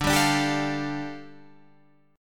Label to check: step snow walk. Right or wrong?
Wrong